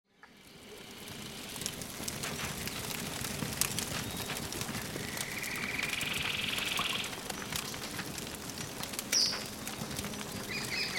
Ambient; Age of Empires III; cinematics

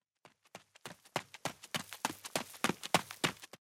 Footsteps; Age of Empires III; cinematics

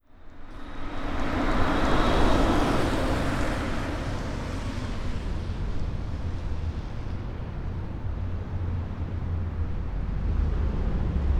Electric Car By; Sony Pictures Sound Effects Series

